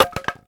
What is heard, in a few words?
Log Stn Hit